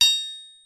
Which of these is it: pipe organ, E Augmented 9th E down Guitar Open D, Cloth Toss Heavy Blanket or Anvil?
Anvil